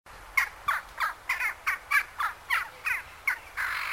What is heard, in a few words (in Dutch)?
Kauw, trekroepjes; tijdens de vogeltrek